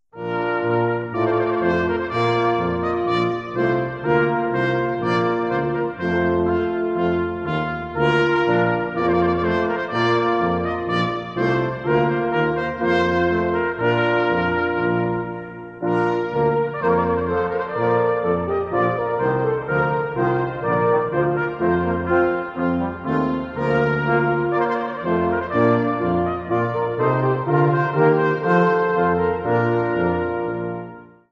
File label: Trumpet